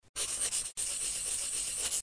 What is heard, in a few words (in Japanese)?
pencil kakikaki; ペン０２ ペンで書く。シャカ、シャカ…